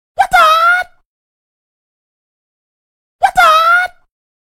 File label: Karate Sound